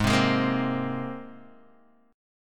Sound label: G sharp Major Add 9th, Guitar Open D